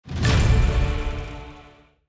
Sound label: box open normal